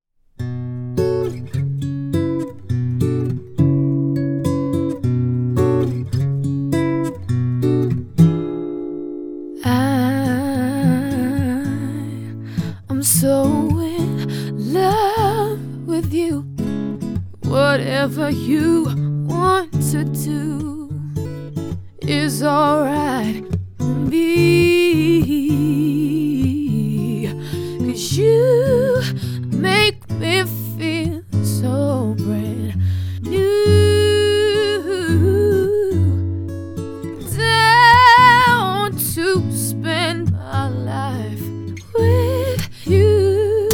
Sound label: Acoustic Duo; London